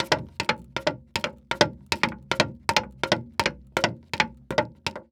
Foley Footstep Hard Sole Dress Shoe Boardwalk Running; Foley Footsteps